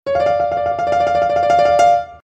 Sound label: sms ringtone